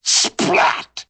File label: Vocal splat Varroom